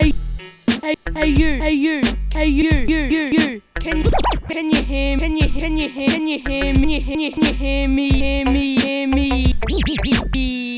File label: scratches; fun palace 2018; beatz